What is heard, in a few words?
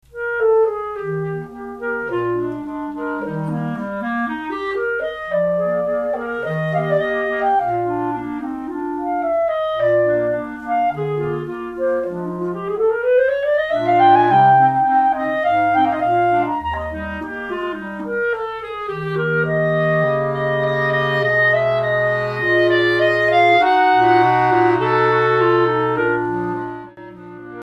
Quartet; Crusell